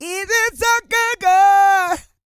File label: GOSPEL; Spectrasonics; Vocal Planet Vol.1 Gospel; akai